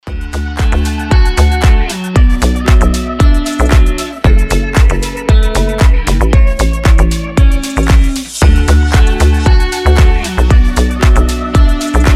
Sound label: deep house mix